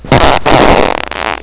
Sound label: fart